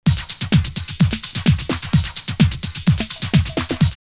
128, drumloops Techno&House; X-tended; X-Static Goldmine

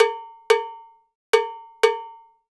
Cow Bell, Latin Percussion

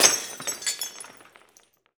WHOOP; Roland - LA Composer vol. 1

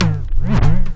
REV SCRATCH, Vinyl Scratches

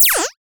High Zap, Cwejman S1

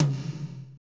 TOM, Drumscapes Roland, Power Ballad

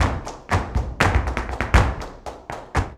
BULERIAS TAP, Flamenco

